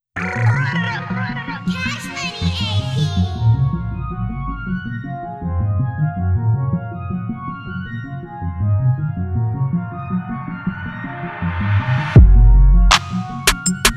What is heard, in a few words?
Instrumental